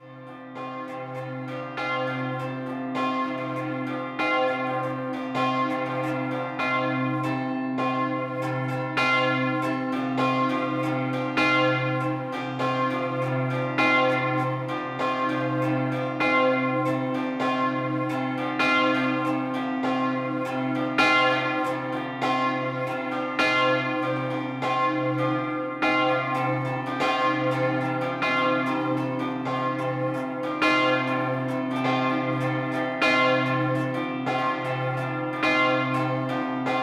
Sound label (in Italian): Palazzolo, campane